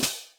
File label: H Cym Cls, Drum Machines, Yamaha DTXpress, Cymbal